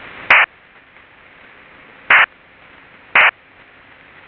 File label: BURST FH PSK (SERIAL); THALES SYSTÈME 3000; SKYHOPPER-PSK